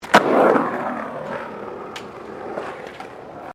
SKATEBOARD SKATE BOWL START; Skateboard sounds; Sports

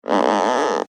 Cartoon Creak Rubber Stretch; Miscellaneous Cartoon Sound Effects; Miscellaneous Cartoon (Sound Effects:Cartoons); SFX Bible 6

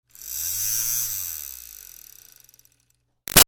Fan Small Handheld Rev Short; Electronics; Big Room Sound

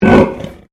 Animal Pig Landrace Inside Grunt

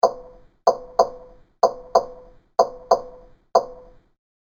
A Series Of Clicks

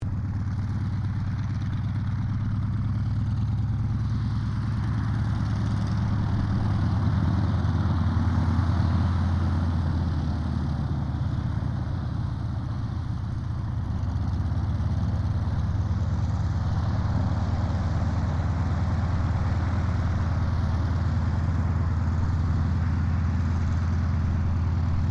AIRCRAFT CAC WIRRAWAY TAXI STEREO; CA-16 Wirraway; Airplanes; Vehicles

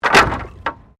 Wood Fall

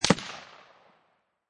Rifle Remington 7Mm Single Shot Exterior At 75'; Rifle: Remington 7mm, Single Shot, Exterior at 75 feet; Lynne Music

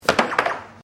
Air Hockey Serve, Air Hockey table; Games

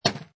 Pinball Sound Chunky Clank; Video Games

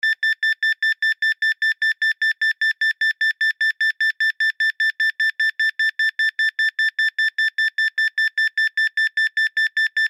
Baby Alarm Pad Beep Long; Household; Alarms; Sound Effects:Electronics

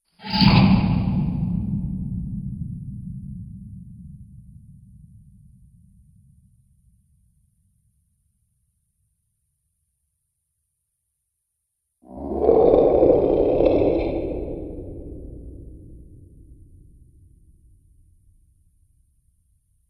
Two Menacing Monster Sounds

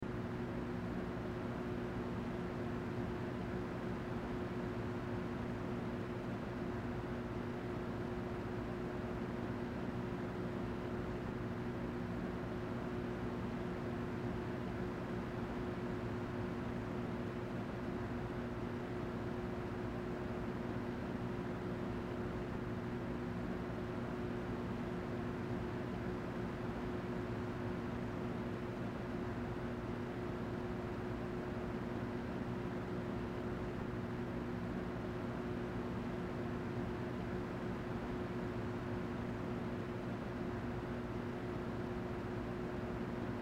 Stove Fan Loop Low, Stove Fan Loop Low Oven; Household; Appliance

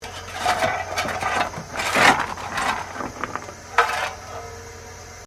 Steamtrain Shovelling Coal; Bellarine Tourist railway vehicles

